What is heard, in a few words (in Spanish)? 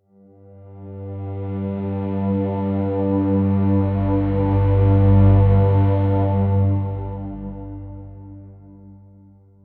pad orquestal sombro; dramatic